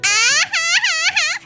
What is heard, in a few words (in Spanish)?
jajajajjajajajajajajjajaja